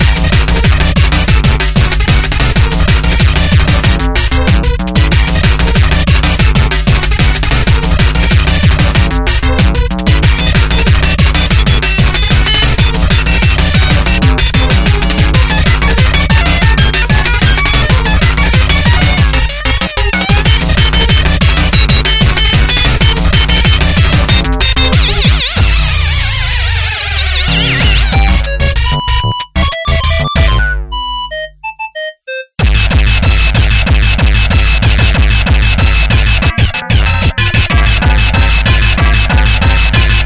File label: Techno; OPM Rotterdam